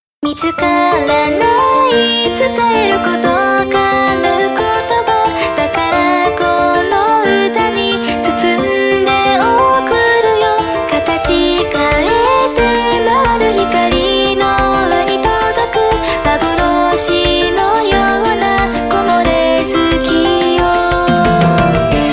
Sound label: Pops